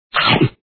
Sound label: chomp; shark